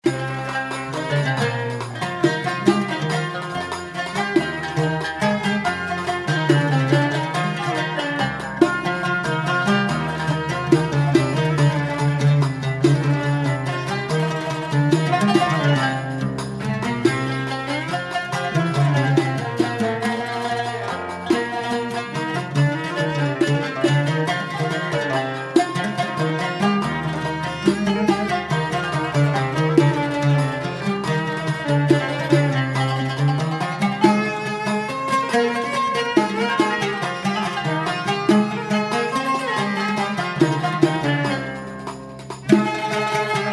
bayati samai

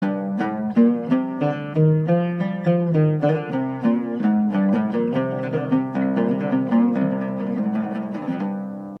maqam bayati nawa G Oud; Maqam Bayati on G

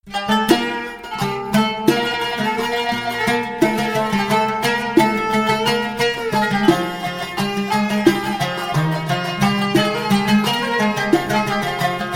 bashraf; aqsaq

